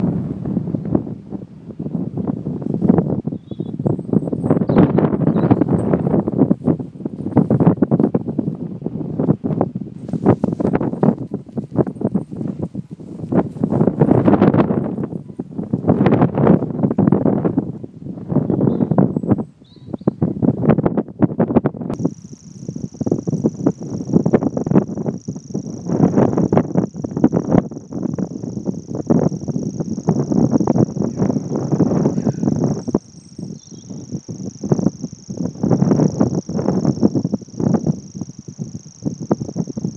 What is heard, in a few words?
grasshopper warbler; springhead quarry